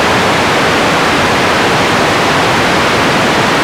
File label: PINK NOISE; Zero-G Ambient 1